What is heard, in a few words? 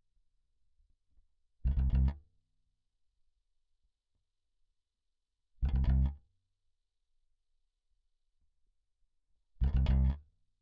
bass